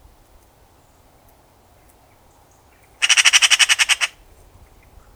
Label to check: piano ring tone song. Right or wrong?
Wrong